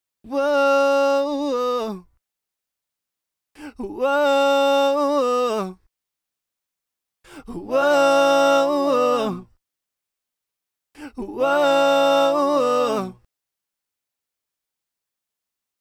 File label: Glove Vocals